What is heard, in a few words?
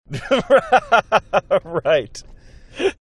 laughing right